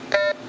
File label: keypad touchtone